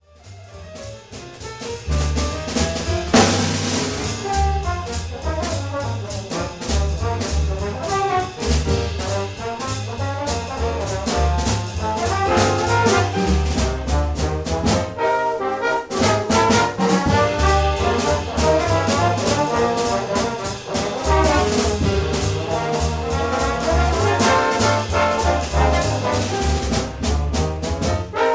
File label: jazz blues, trombone